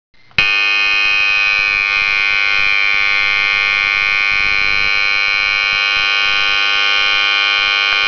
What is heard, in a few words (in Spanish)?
tono